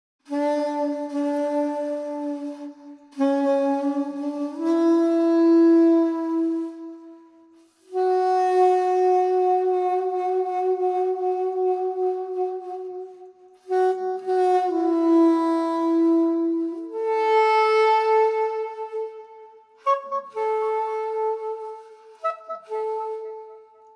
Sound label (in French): Fin Sax soprane E2